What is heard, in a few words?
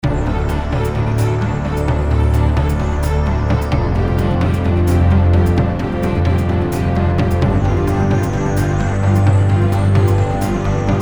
Epic Trailer Dynamic Logo; Air Guardians; Windows